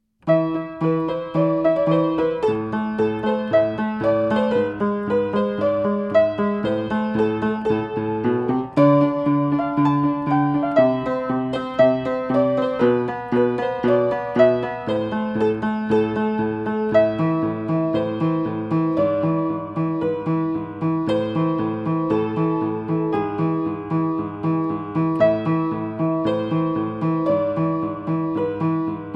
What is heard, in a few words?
tetris piano